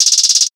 HAT ROLL, Closed Hats

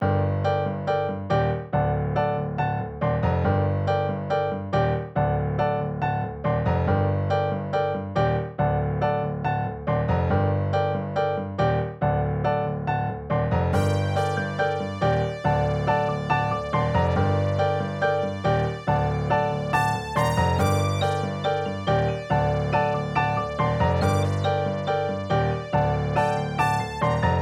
Melody

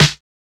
Snares

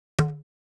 Percs

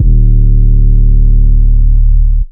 bass; 808s

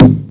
Kick

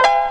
Piano

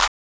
clap

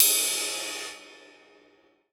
Ride, Crashes & Cymbals

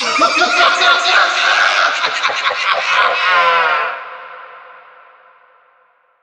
LAUGH

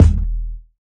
kick sub, 808s

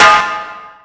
Metal Snare; Sample God

